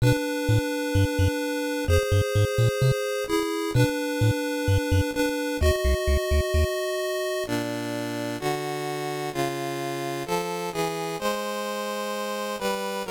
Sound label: Dungeon; Wizardry Proving Grounds of Mad Overlord; NES